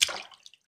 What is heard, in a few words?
noteblock splash; mesecons